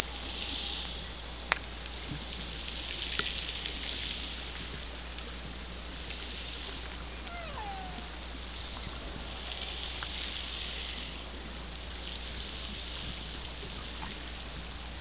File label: Hydrophones, orcasound